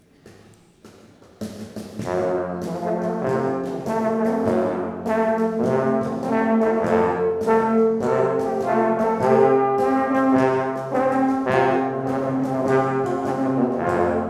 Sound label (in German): Alphoerner Blues Zugabe; Deggenhausertal